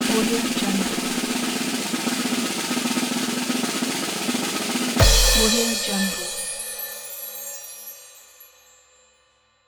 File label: drum roll